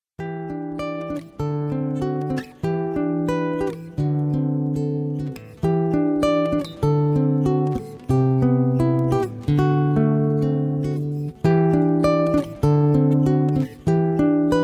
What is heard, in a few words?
Instrumental